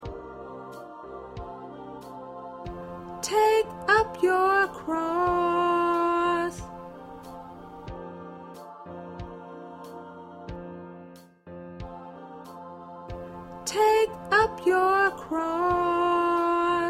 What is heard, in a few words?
Backup Alto